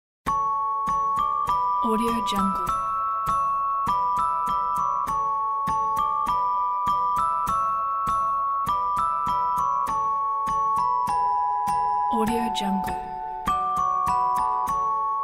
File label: joyful bells